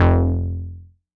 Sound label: bass F1, Korg MS-20